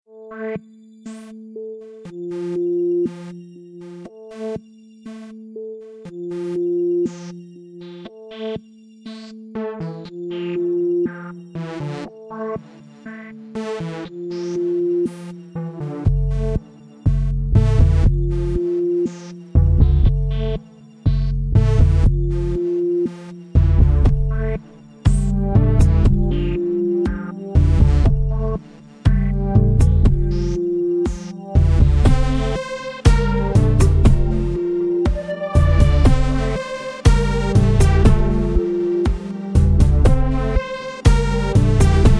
F60 Groove

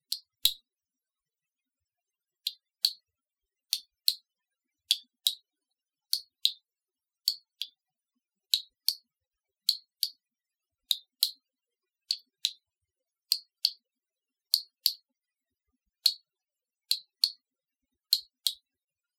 Perc; Collabunity Perc Two

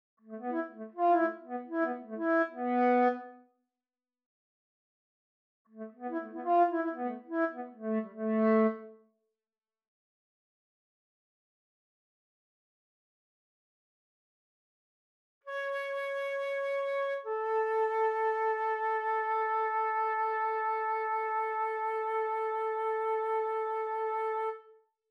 Flute

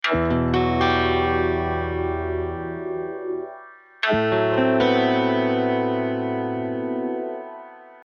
12-String arpeggios

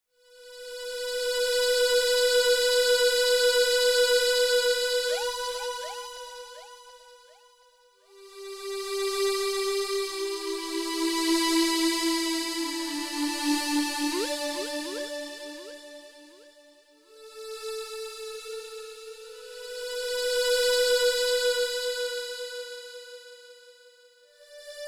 Synth Pad Loop

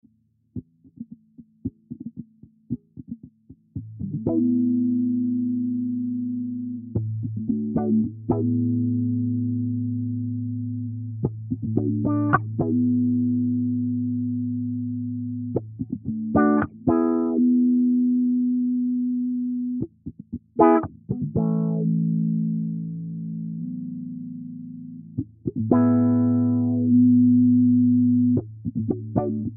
E 112 GTR Riff Trashed

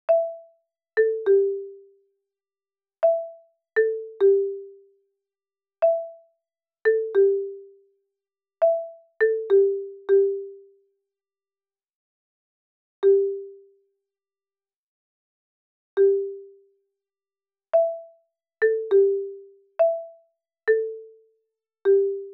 Marimba

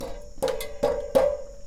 perc

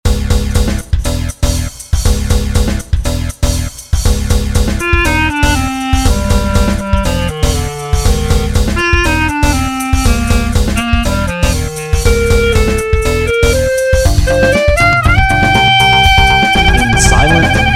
Chocolate Clarinet; In Silent Thunder

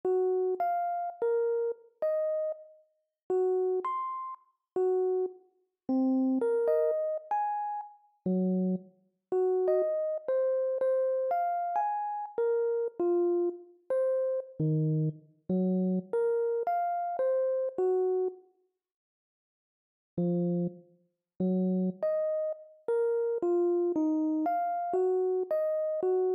Rhodes

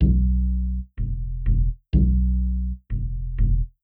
bass

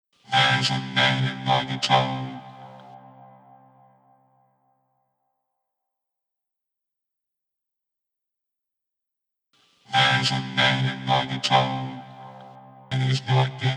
guitar

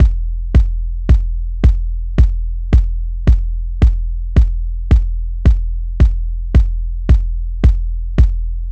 Guitalele

